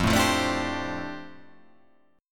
F 6th Add 9th F down, chord

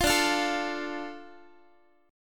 D Minor D Guitar; Dm strummed; JGuitar

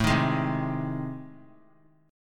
A Minor Major 7th Flat 5th; strummed; AmM7b5; 21,22,23,22; JGuitar

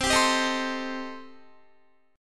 C Minor 6th, C, down, Guitar; strummed; Cm6; 4F,4A,5C,5G,5C,6E

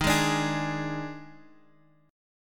Eb Major 7th Suspended 4th, Guitar Half Step Down

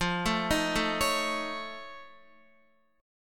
F Augmented 7th F Guitar, chord; F+7 arpeggiated; x,8,9,8,9,9; JGuitar

